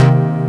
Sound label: chord